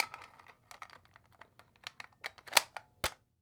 Weapon Gun Rifle Military M1 Carbine Clip Insertion; AdobeCC